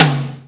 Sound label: TOM HI, Power Drumkit; Hip Hop; style kits